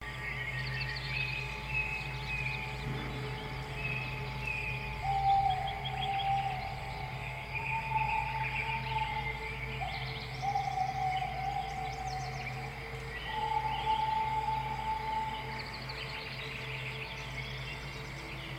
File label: Twilight Forest Dark; MichaGhelfi; World Tree Roots